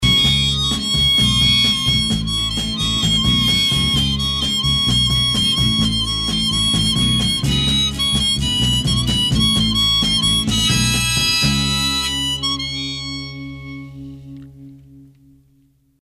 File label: solo